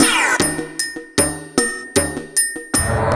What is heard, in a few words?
Electronic Percussion